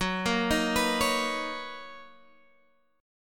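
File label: B Major 9th G flat, chord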